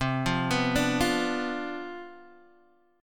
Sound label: C Major 7th Suspended 2nd Suspended 4th C down Guitar DADGAD, chord